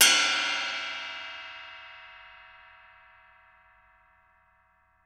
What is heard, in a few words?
ride lo